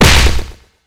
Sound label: damage